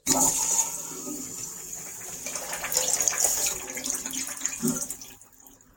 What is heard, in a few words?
toilet water